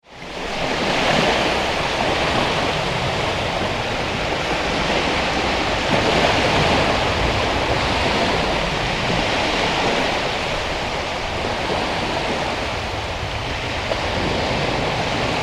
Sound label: Ocean Sea Shallow waves on Incoming Tide; Close recording